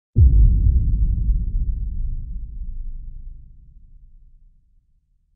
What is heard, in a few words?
Dramatic Cinematic Boom